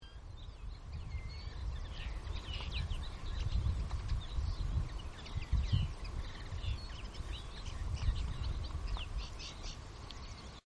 Kneu Carduelis cannabina Geluid

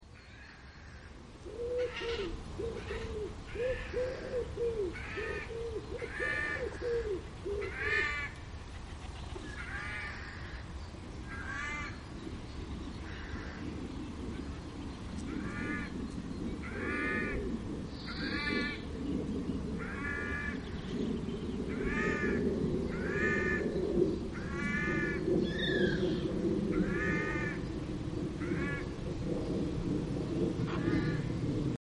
Gaai Garrulus glandarius Geluid; Annelies van Klinken

